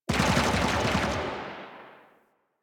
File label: Rail Jack Fire; The New War; New War Intro Moon